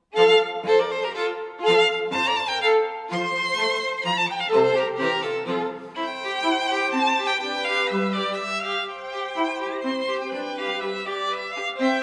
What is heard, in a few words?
Relaxing Classical Stream